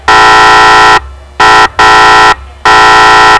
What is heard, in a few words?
Horn; Code3